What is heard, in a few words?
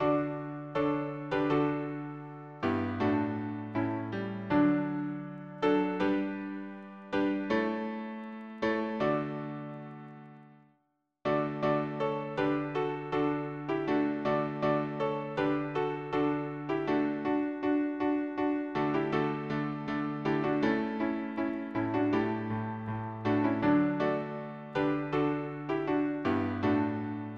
harmony piano